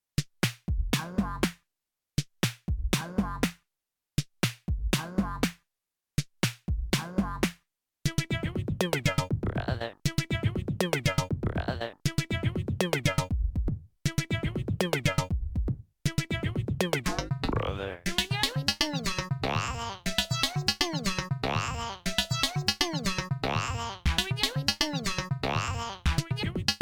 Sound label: TE PO 137 Jam